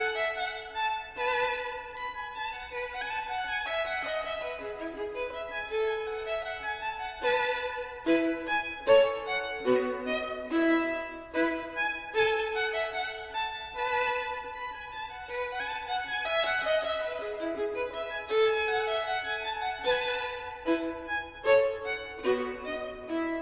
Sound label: Gavotte en rondeau, Violin